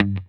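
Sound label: electric guitar note mute G3 right; Sampleheads; Whole Lotta Country 1 & 2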